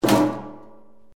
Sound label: metal crash